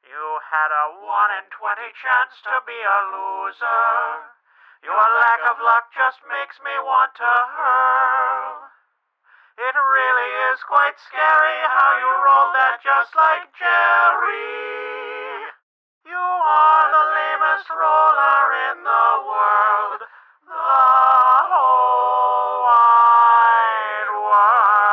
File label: Critical Fail